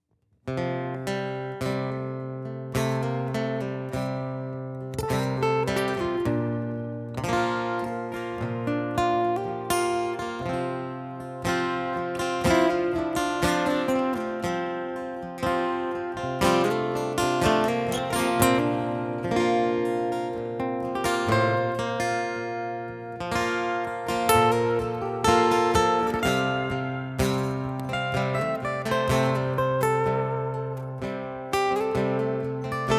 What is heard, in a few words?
Martin with Anthem Pickup